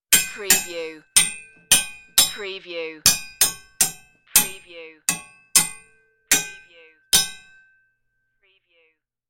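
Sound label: Metal Banging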